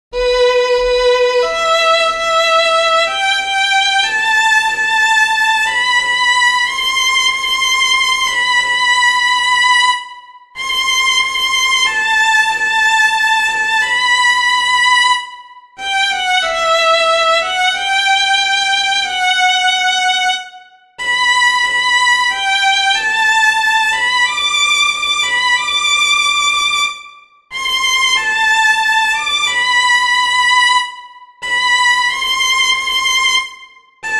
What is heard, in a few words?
erhu